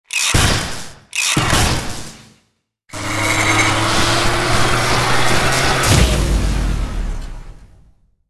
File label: Unlock Door; Jett Rocket II The Wrath of Taikai